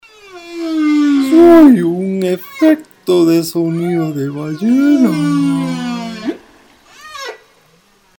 whale sound

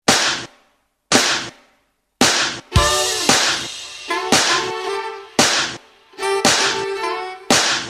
ants smacking

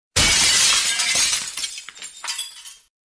glass breaking sound effect

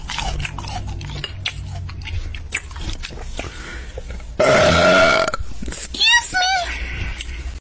excuse me burp

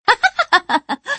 wind kill

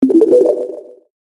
elixir noise; coc

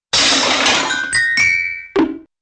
junk crash three rising dings and drum plunk

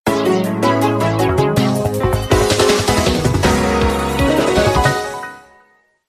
battle start music; kirby fighters; kirby triple deluxe

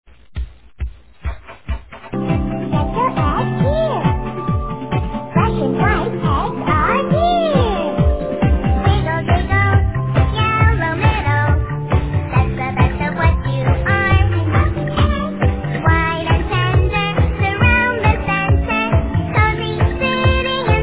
egg song

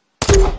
m79 grenade launcher

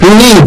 yeeee

